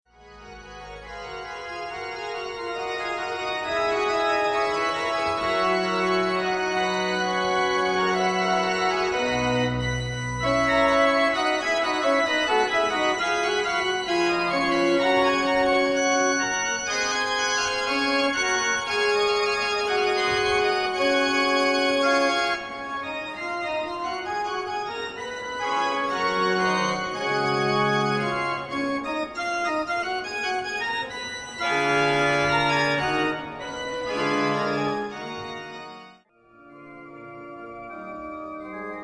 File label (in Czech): varhany, dva manuály, 29 rejstříků, středotónové ladění; včetně mixtury v pedále; Plasy; Naposled ho restauroval Vladimír Šlajch v letech 2004 - 2006